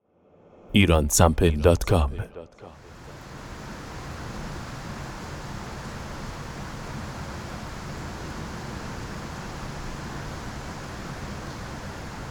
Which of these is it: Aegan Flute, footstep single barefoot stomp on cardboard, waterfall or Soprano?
waterfall